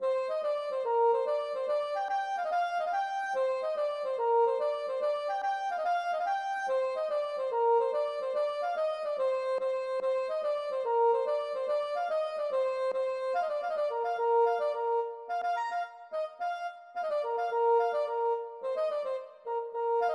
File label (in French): gavotte Des Vieux